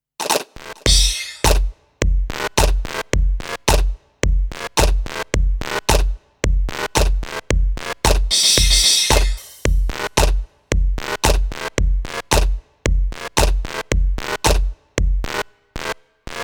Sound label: Alesis DM Dock Electronic Kit